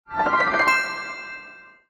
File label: Star Earned; Cookie Jam